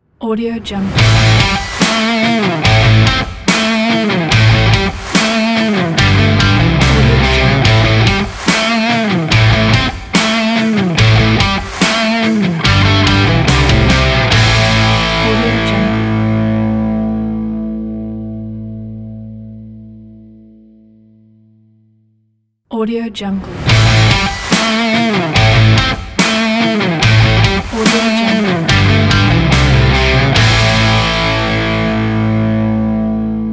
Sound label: rock ident pack